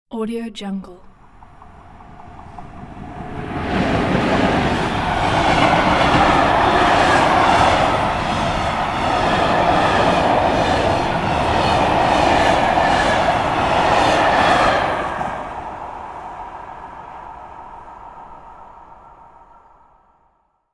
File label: fast cargo train close passing